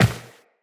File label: Step Default, Footsteps, Walk; Tenno